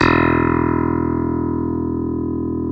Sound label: PIA CLAVI E2, PIA Clavinet; Sound & Vision; Gigapack I CD 2